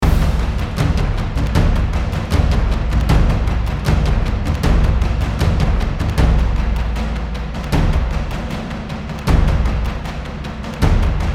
bat taikos; 现代打击乐器; Audio Ollie LA Modern Percussion 1.1 KONTAKT

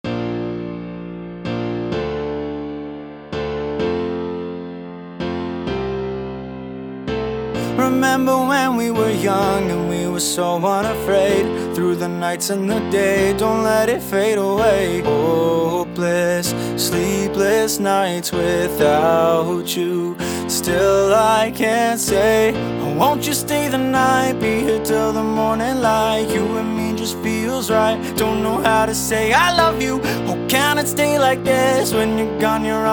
I Love You 128bpm Bb, Acapellas & Vocal Chops; Elation Sounds; EDM

